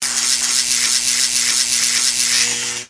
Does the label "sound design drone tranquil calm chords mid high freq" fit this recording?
No